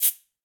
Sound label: Cabasa Long; Latin Percussion; Drums; Drum Hits; Shaker; Ableton; Factory Packs